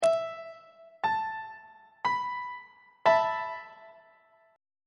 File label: g double sharp suspended 2nd 2nd inversion on treble clef